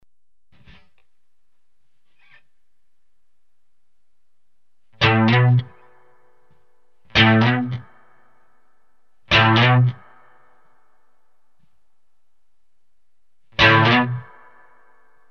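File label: electric guitar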